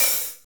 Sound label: HAT, Bhangra; AMG - Now CD-ROM (Roland)